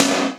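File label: SNR, snare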